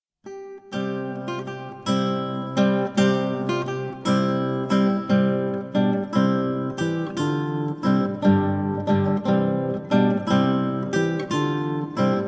Deutscher Tanz

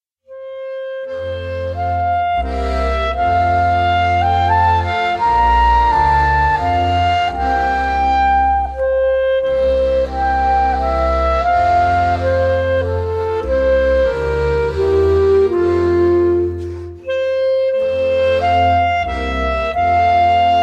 Menuett

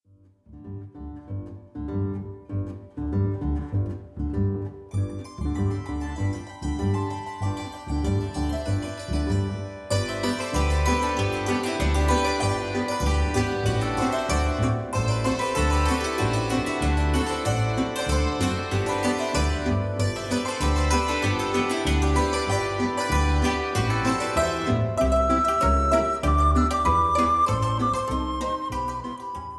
Polka, Saitenspiel

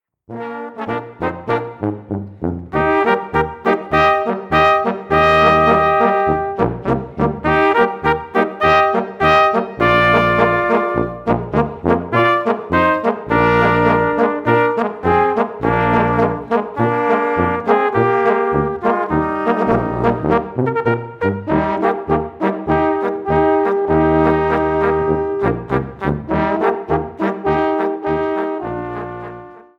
Turmbläser

